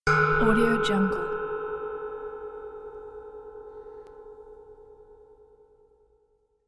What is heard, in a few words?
cinematic sound design